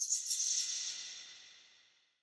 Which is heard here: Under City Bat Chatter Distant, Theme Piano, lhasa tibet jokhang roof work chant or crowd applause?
Under City Bat Chatter Distant